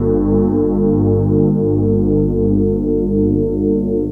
Connected Modu Pad; Jungle; Pads; Blu Mar Ten; Jungle - 1989 to 1999

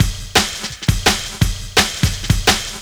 War Peace Break, Jungle; Breaks; Blu Mar Ten - Jungle Jungle - 1989 to 1999 Samplepack

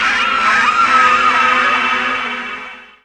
Whales; Jungle; FX; Blu Mar Ten - Jungle Jungle - 1989 to 1999 Samplepack

